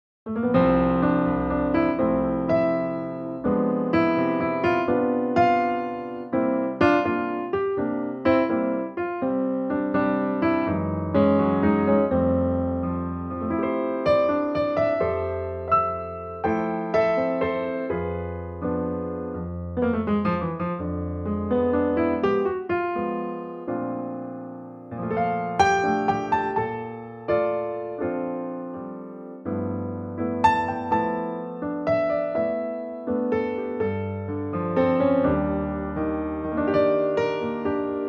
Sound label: piano trak Bb; key - Bb (the song starts in F) - vocal range - Bb to D